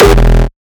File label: kick oneshot monster E; GhostHack